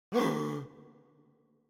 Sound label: Gasp